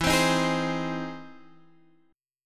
E Augmented Major 7th E down Guitar Half Step Down